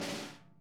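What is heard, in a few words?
snare buzz; Sonic Reality; Interactive Drum Kits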